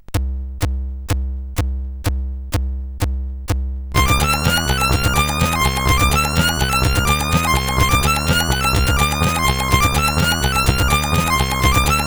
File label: Techno Style, CSG8580R5